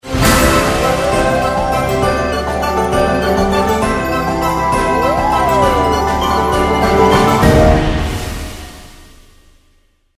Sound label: Big Win; Candy Bona